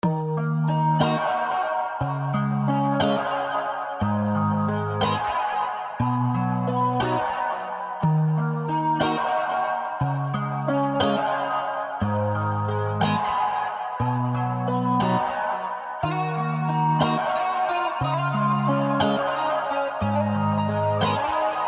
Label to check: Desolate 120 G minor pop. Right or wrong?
Right